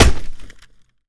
keyboard hit, weapons, melee

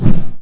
mace swing; boss; sewer

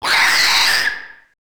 demon scream; nh demon

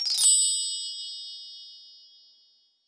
save load; garrysmod

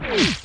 bullet L to R slomo, near miss; weapons

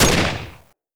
gun, weapons; alyxgun

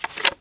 bow take arrow; deathlegends

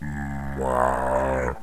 moan loop; shamblie; npc

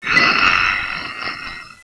alert

